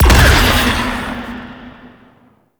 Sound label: Ship Cannon Fire, Flak Cannon; Warframe; Railjack; RailJack Weapons; Ghost Ship